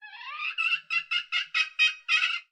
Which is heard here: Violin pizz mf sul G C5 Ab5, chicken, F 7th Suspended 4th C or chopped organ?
chicken